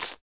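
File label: vox percussion, Vocal Planet Jazz FX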